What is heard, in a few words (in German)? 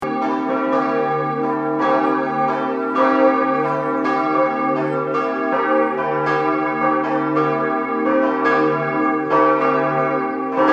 Glocken